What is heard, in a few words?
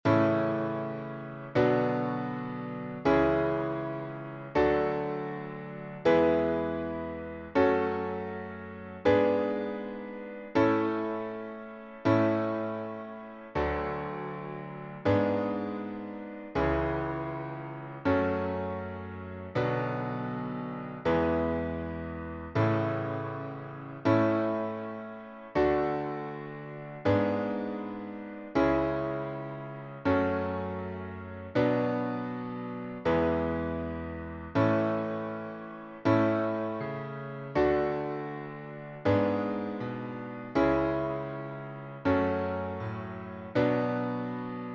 Full Diatonic Major - A